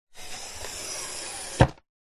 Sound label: office chair lever up slide hydraulic